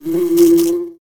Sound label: Wasp Attack